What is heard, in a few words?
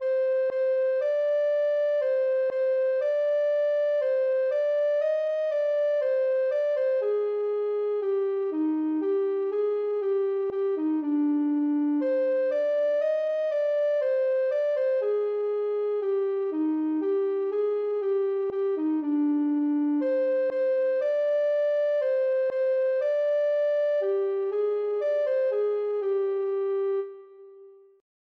ocarina